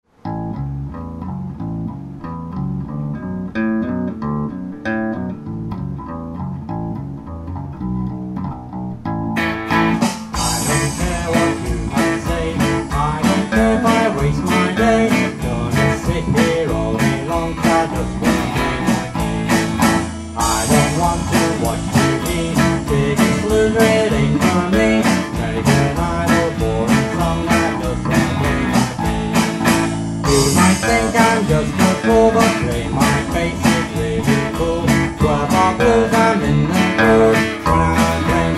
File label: Play My Bass